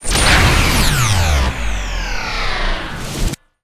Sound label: Monster attack; Yu-Gi-Oh! Master Duel